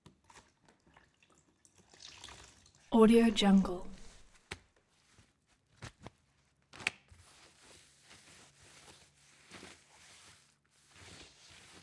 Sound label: scrubbing floor with small rag; collanderson